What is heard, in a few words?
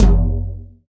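PER PROCU, Procussion, percussion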